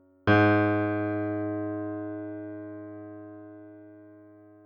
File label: g#1, piano